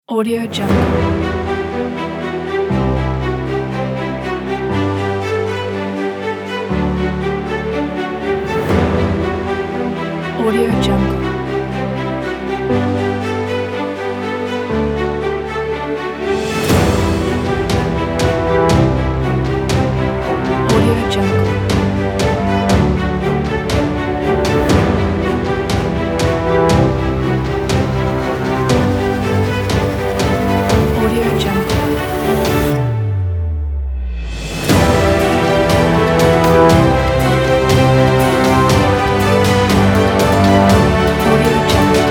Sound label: heroic trailer